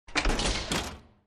window

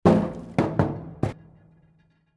demolition

